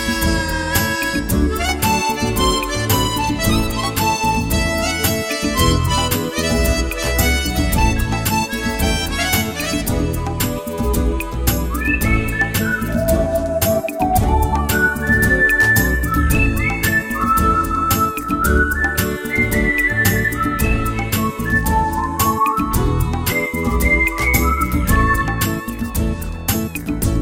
Swing Ballad

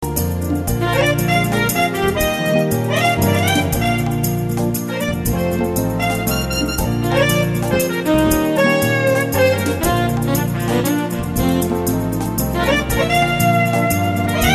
Slow Jazz Waltz

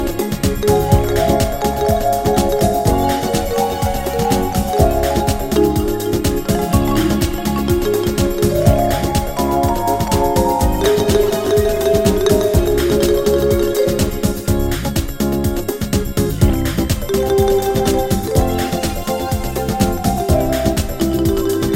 Tribal

